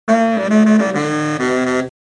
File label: SAX; Bordell der Begierde